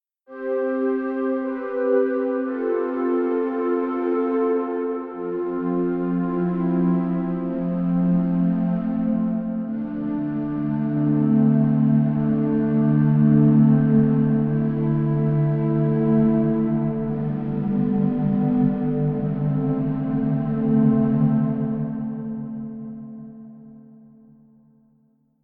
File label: woodwinds; Spitfire Audio; Producer Portfolio; PP0025EVO GRID 4 – WOODWINDS